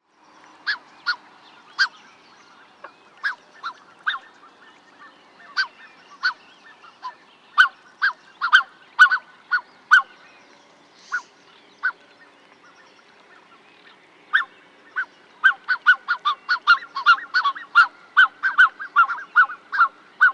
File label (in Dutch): Zwarthalszwaan Cygnus melancoryphus